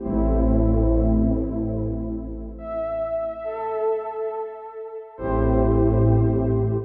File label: techno pad 140; Techno2; Keys